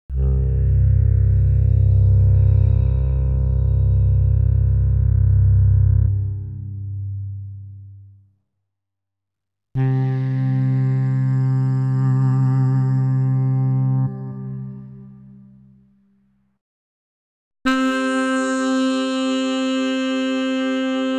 Sax Choir C1-5; Klaus Schulze Collection

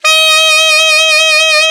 ALTSAX D3; Best Service; Alpine Volksmusik

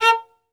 Synclavier Strings, SOLO VI; ILIO

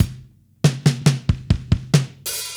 drums fill, analog funk; Mixed Berry Jam; Sonic Foundry (Sony Creative Software) - What it Is! 70s Analog Funk

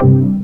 VOCODER DRUM

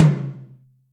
TOM Xfad M, Acoustic Tom; Yamaha DTXpress

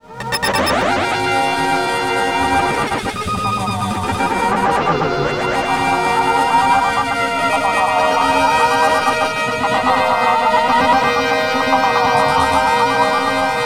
Jazz Noise

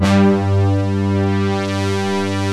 SYN BRS & CHOR

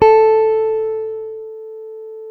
A 2 JAZZ GTR; Univers Sons; Jazzistic

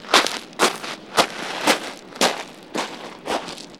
sfx, FX Atmos; Best Service ProSamples vol.36; Chillout

